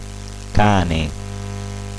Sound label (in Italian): cane